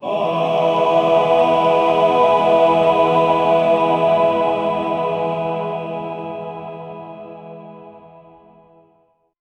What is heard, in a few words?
celestial choir, choir